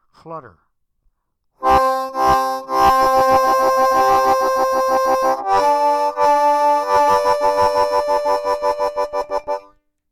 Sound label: Flutter